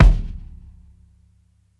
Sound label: KICK MED, drums; ONE SHOTS; BASEMENT DRUMS VOL 2